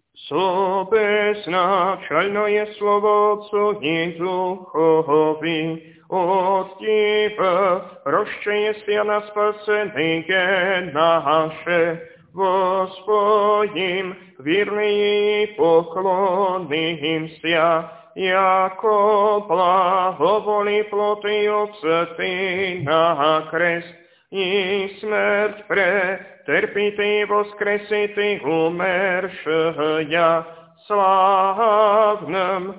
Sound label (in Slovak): Tropár voskresný, hlas 5; nedelna-skola